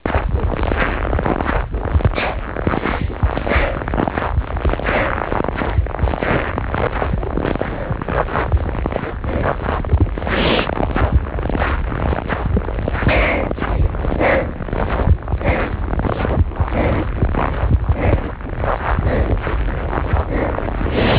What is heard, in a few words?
walk